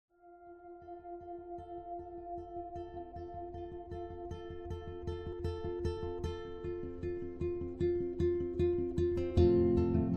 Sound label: Relaxing Ambient Chill Music; Life is Strange Soundtrack; One Hour of Relaxing⧸Ambient⧸Chill Music to Study to (All Seasons)